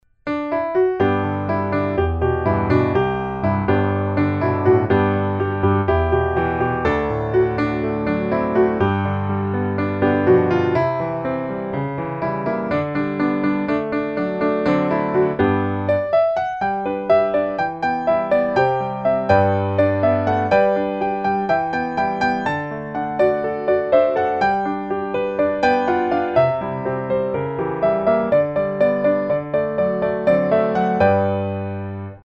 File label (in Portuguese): piano